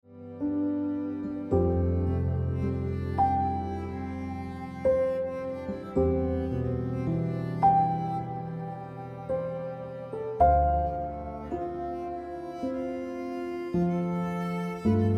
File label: Ringtone Piano Music